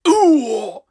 Miner Death; Col Miner Death

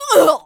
Enemy Generic Human Death Crushed; Rampage

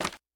Obstacle Wardrobe hit; In Obstacle Wardrobehit

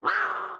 Cat Attack

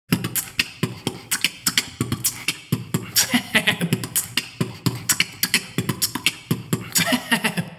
beatbox 16 beats; temple

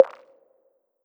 Ice Pop; Ice Sta Pop

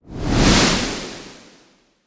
Ice Attack Swoosh

